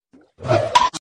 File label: boink